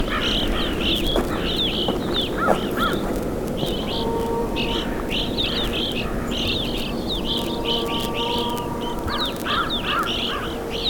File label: Evening Grosbeak Hesperiphona vespertina Type 1; BC